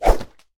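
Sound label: random swing with fly swatter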